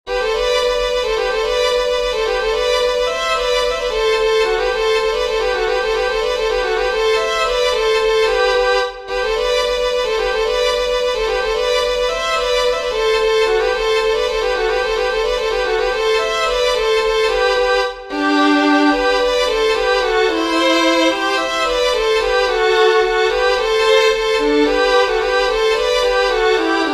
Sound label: GOLDEN SLIPPERS with Harmony, fiddlers; southern kings fiddlers